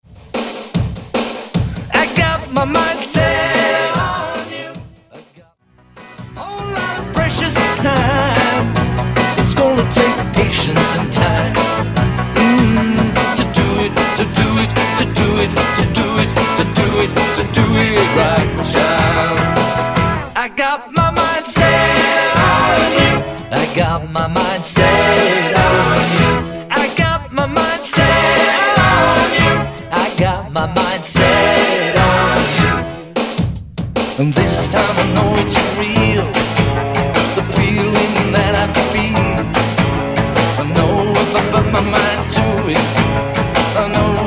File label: 80s Dance Redrum